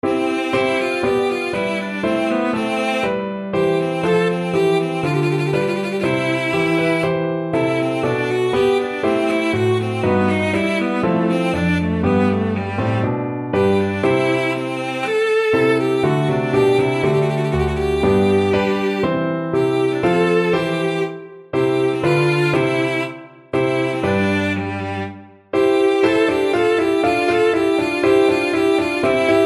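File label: violoncello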